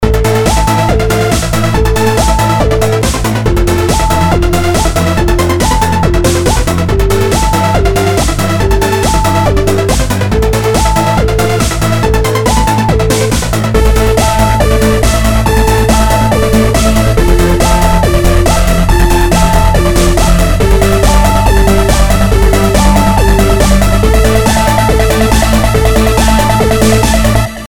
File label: techno